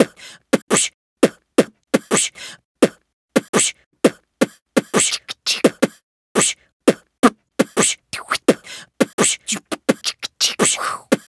beatbox loop; OK K.O. Lets Play Heroes

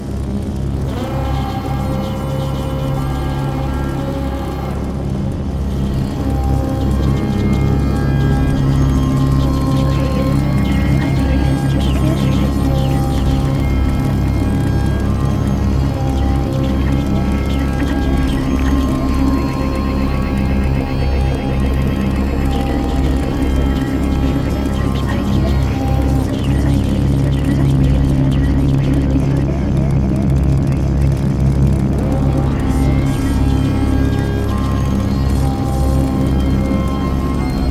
Loop Heavy Electric Weather

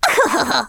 Emote Laugh; Target Hungryfrogs

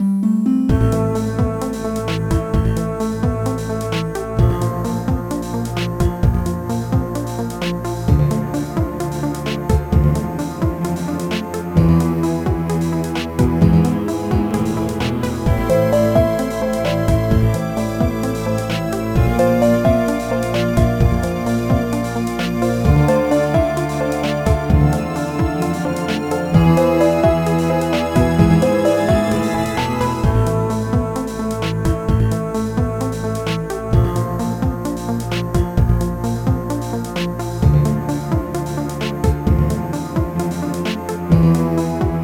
Swiss Alps Alphorn; Kim Possible Global Gemini

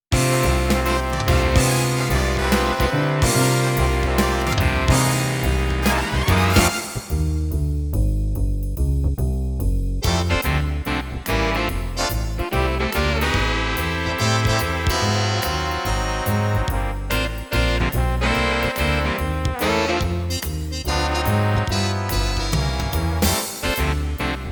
Jazz Lagoon; Roland Sound Canvas SC-55; built-in demo songs; MS-DOS

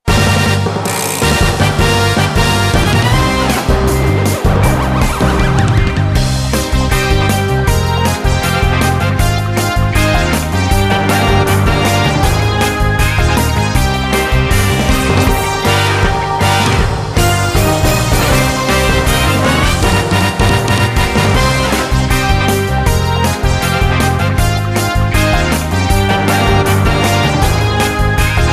Music Success event

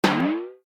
Trampoline; Home Sheep Home; iOS Online; timmy

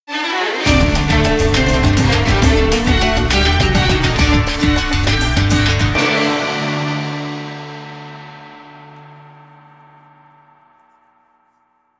Win

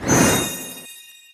Fx Cake Poof; Levels Hub Diner; Memory Cake

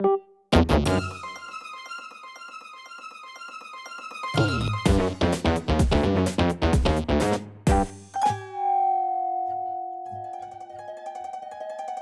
Drill Jingle Game Over; Dr Kawashima's Brain Training for Nintendo Switch

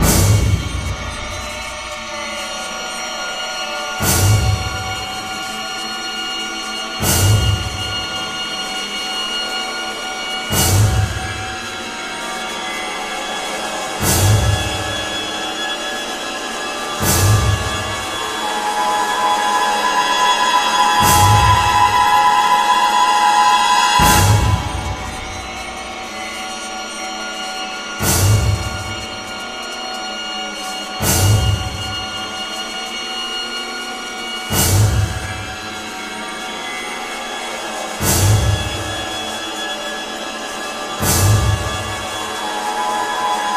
BGM; Adventure Player; psp

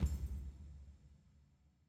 Footsteps; Rhythm Hermit

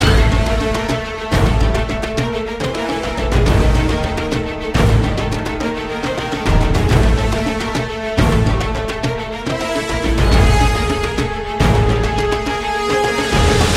Jungle Theme Drum; Marlow Briggs and the Mask of Death

